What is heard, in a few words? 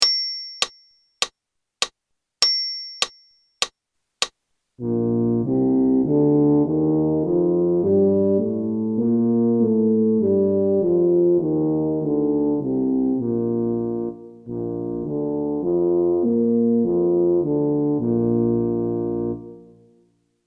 G major in EbBb maj count in, brass, practice